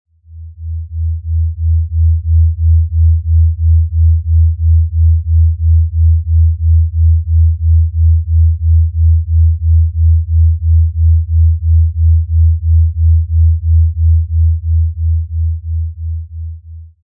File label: Binaural Beats 3Hz Delta Wave Range